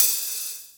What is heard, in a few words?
Hat, Ensoniq ASR-X